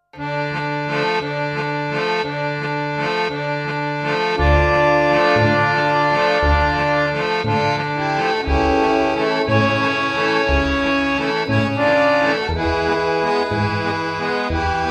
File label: Harmonium